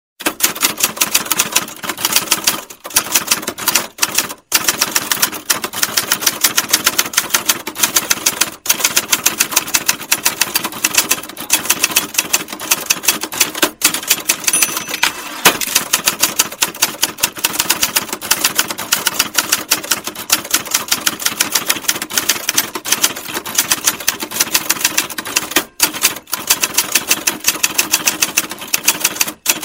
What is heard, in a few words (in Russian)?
ochen staraia mash dlya pechati